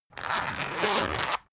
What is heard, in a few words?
zipper run long slow